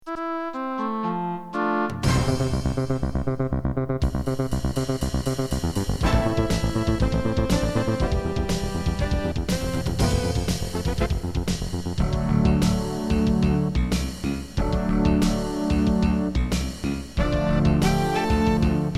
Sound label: barock